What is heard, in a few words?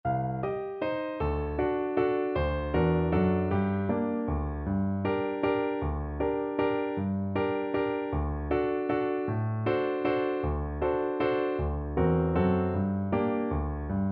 Tumbalalaika for Bassoon